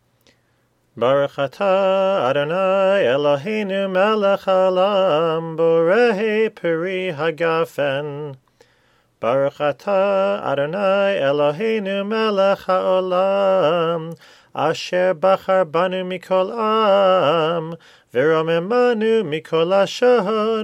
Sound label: Friday Night Kiddush